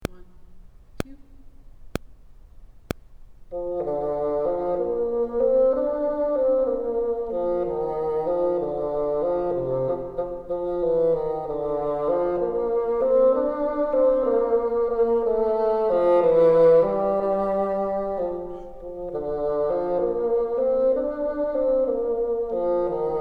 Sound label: top, bassoon